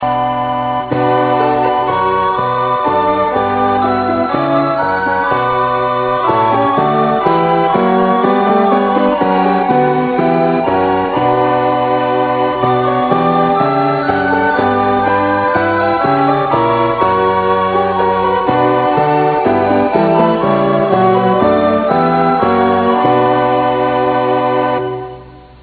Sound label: hymn